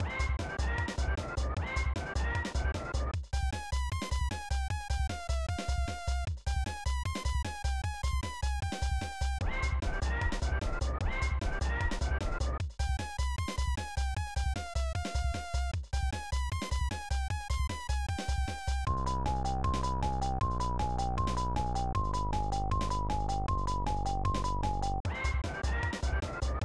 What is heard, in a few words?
Level Music; Clock Works; SNES